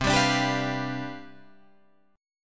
C Major 7th Suspended 2nd Suspended 4th, Guitar Drop D, chord